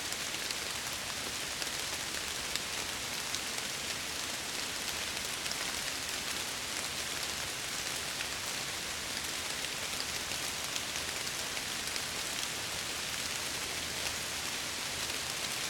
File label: rain loop ontario, ambience; netfishing